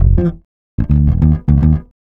Electric Bass; Basses

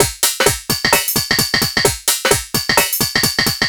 Dance Drumloop; Drums; Dance Beats

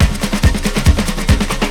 loop; SoundFactory; SMPMANIA

